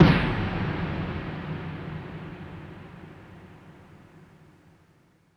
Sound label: taik